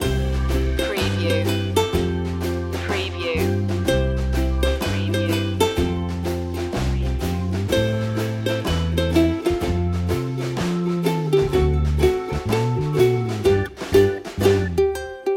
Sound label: playing and playing loop